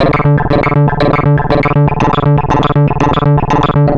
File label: Atonaloop, Industrial Analog